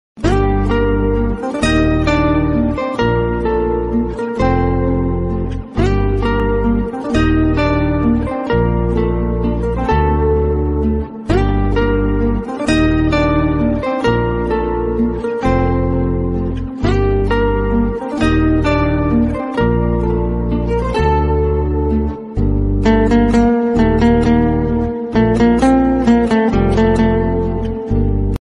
movie emotional bgm ringtone song love bgm south indian bgm ringtone; ninnu kori movie